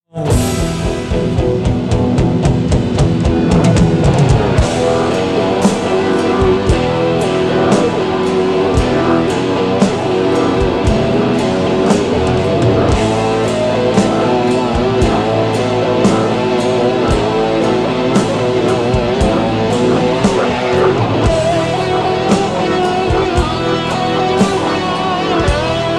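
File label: solo, guitar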